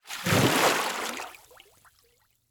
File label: Liquid Water Water Push